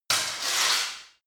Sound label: sword hit scrape sword